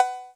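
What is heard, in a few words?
An Cowbell, Yamaha DTXpress; drum machine; Percussion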